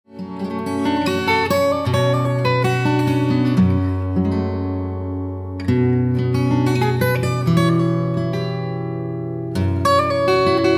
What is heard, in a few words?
cantilena